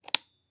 Black Light Switch; Haunted Bloodlines; SFX Producer

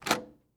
Door Wall Lock; MinorHallutinationsSounds; SFX Producer

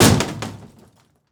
Door Kick Open; MinorHallutinationsSounds; SFX Producer

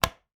Light Switch Flip; Haunted Bloodlines; SFX Producer

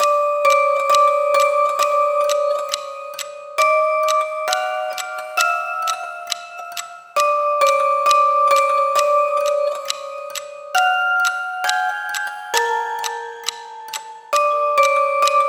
HORROR MUSIC BOX; HauntedBloodlines

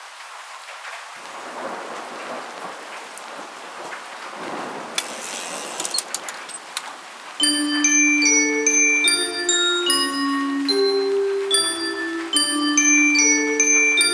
Gloomy Musicbox; HauntedBloodlines; HORROR MUSIC BOX

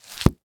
Book Thick Book

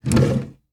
Drawer Open; HauntedBloodlines; SFX Producer

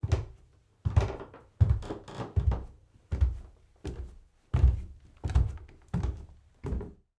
Footsteps Wood; HauntedBloodlines; SFX Producer; MinorHallutinationsSounds

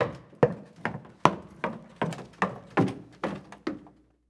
Stairs Down Fast; Footsteps; HauntedBloodlines; SFX Producer

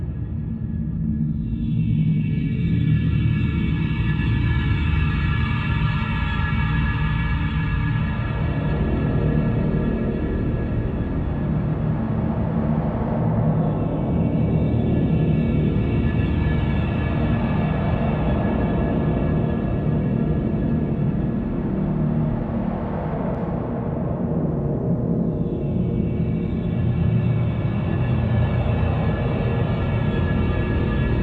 Cell Block B, Horror Atmospheres Music; Miasma - Horror Atmospheres Music Pack

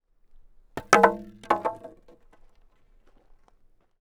Wood Drop Tent Poles Fall; Props; SFX Producer; HauntedBloodlines

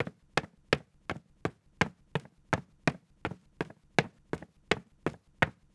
Footsteps Wood; HauntedBloodlines

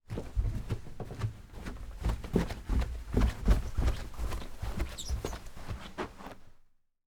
Stairs Down Carpet, Footsteps

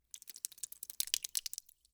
Blood Drips; Haunted Bloodlines; SFX Producer

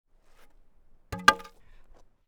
Wood Drop Tent Poles Fall; Props

